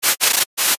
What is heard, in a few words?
grass rustle short